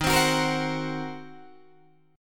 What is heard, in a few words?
Eb Minor 6th, Guitar DADGAD; Ebm6 strummed